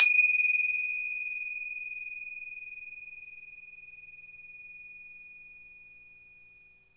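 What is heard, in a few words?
finger cymbal, Synclavier Percussion, World & Orchestral; ILIO; Synclavier Percussion 2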